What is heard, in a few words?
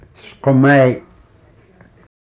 dog; katzie